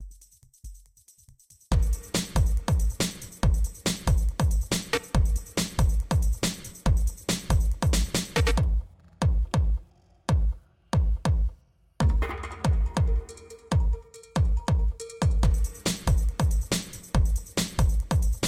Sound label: drums